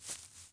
footsteps grass

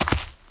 step ground; people; Pirates Of The Caribbean

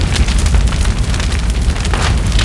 big fire; Soldier Of Fortune II Double Helix; XBOX; ambience

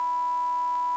1k Sine; interface

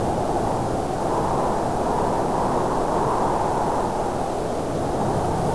wind sea; Pirates Of The Caribbean

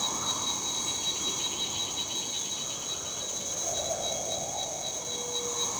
jungle wind, colombia; ambience; Soldier Of Fortune II Double Helix; XBOX

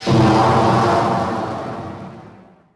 blastoff, vehicles

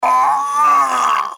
writhe, pain death, male; Soldier Of Fortune II Double Helix; XBOX

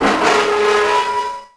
door iron gate open; doors elevators; Star Wars Obi-Wan; XBOX

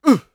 get hit voice; UFC Tapout

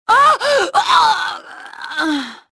death

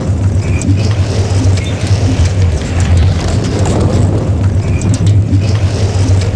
roll glass; terrains; Tony Hawk's Project 8; XBOX

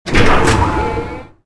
walkway start; movers platforms

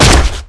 body impact cement

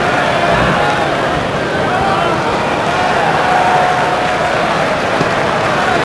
Small Crowd Idle; David Beckham Soccer; XBOX

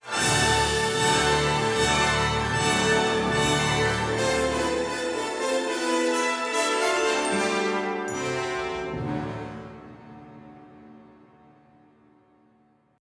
music flourish, intro

